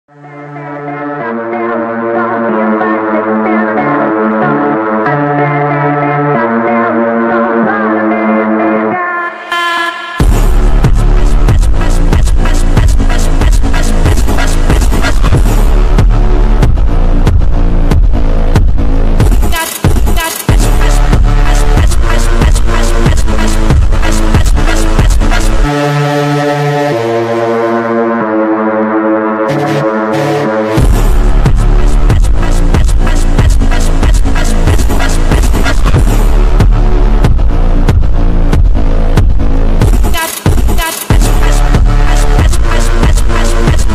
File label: slowed reverb